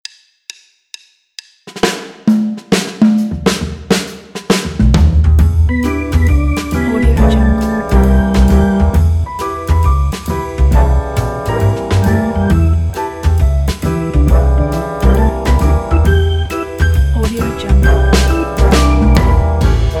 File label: midnight detective jazz piano